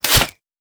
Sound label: bow-release; SupaLidlGame